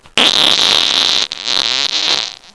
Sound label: fart; T&P Fart Central